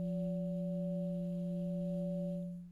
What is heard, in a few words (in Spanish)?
Multiarmonicos